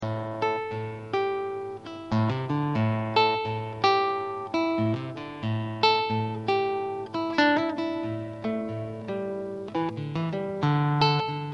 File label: hammer blues